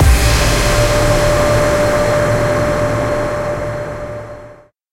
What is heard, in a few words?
stinger; Mirror's Edge (2008)